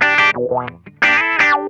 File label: CROAK LICK, Funk Guitar; Zero G - Funk Guitar